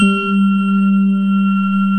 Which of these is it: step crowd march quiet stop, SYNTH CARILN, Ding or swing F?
SYNTH CARILN